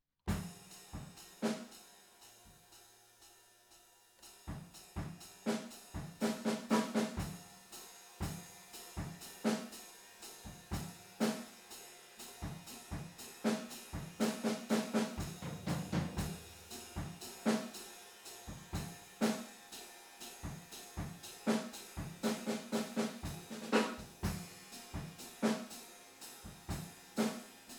TB GTR

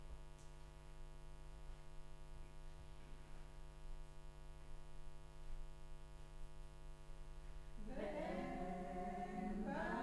Room, Choir

